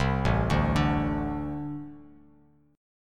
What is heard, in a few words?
A-Minor Double Flat 5th A Bass Standard; arpeggiated; JGuitar